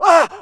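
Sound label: male scream, voices; Half Life Model Pack; Half Life 2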